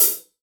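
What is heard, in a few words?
open hi-hat, Real Drum Kit, ROCK KIT; Best Service ProSamples vol.43 - Real Drum Kits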